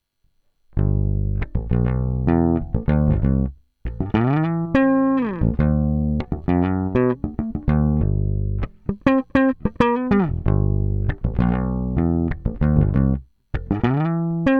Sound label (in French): PB57 flats tona on; LaBella FS